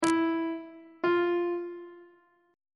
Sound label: d double sharp 1st interval pitches on treble clef